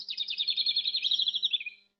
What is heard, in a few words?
bird chirp